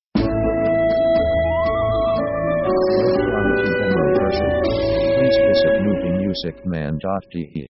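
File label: orchestra spanish waltz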